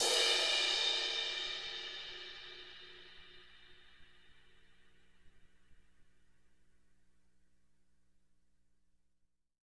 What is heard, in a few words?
CRASH